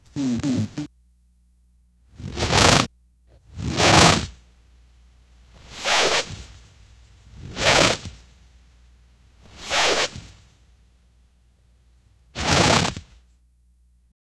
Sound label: giant may beetle wing fla